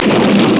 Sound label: SCRAPE